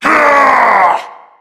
shout long

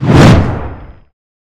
common swing

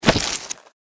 gecko swing; npc

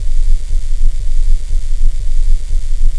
idle, weapons; tfa cso; gungnir

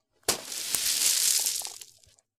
process filling crate

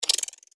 giant rat hiss; npc

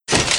movement

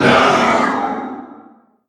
vox atk

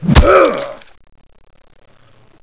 zombie attack

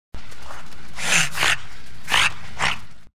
gecko vox; npc

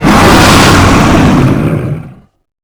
dragon threat short

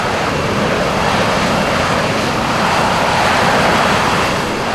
rotor

